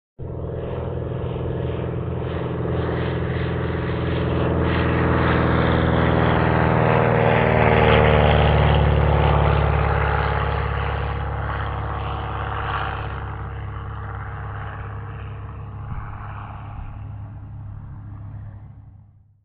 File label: AIRCRAFT PROP ANTIQUE SINGLE ENGINE: EXT: Fly by medium speed. Low pass by.; ww2 fighters